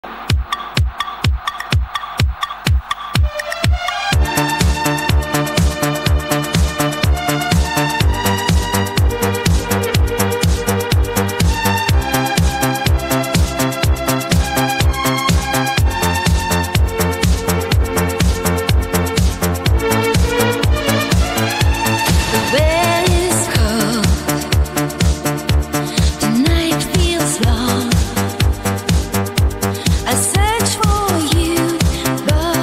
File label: italo disco 80 s style